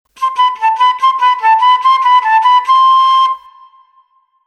fluitmelodien

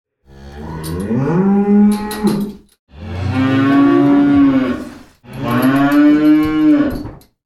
dierengeluid

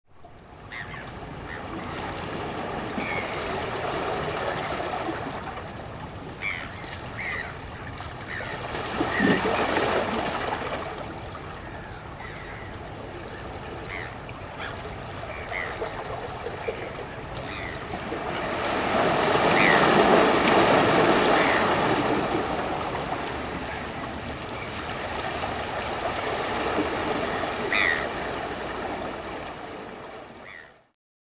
omgevingsgeluid

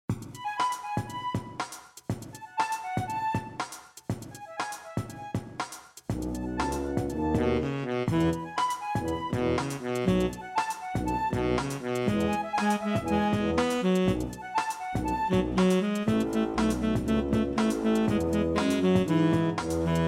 allemaal voor jou zonder zang

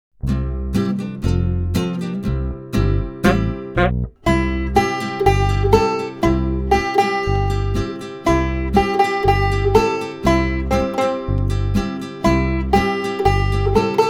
zonder zang

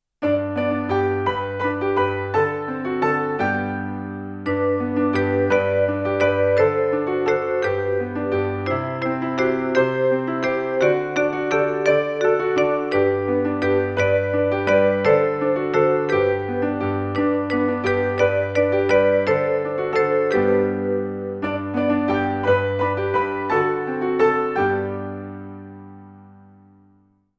zonder zang; Genre: Blues

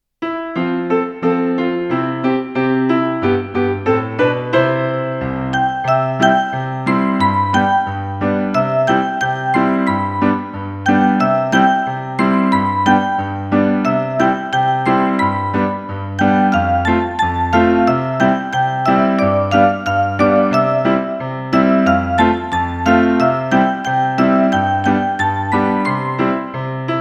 zonder zang

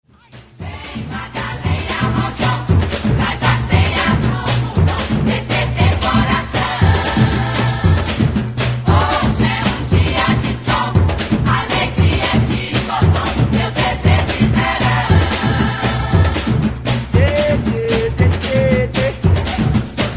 muziekgenres

